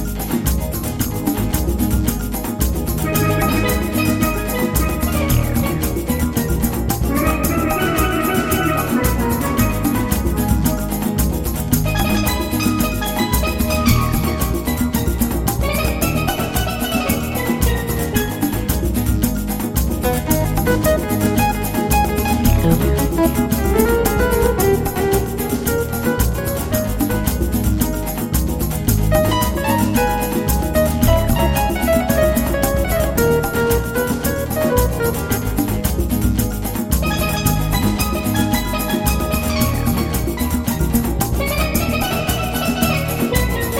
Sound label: Samba Brazil